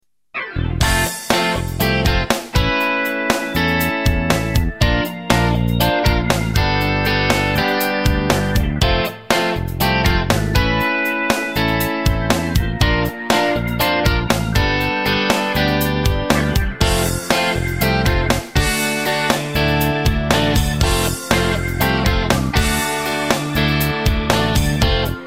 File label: Chord Licks, guitar